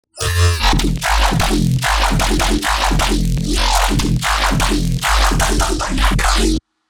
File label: Dubstep 150bpm